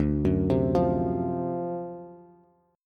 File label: D 7th Sharp 9th, Bass; 10,9,10,10; arpeggiated; JGuitar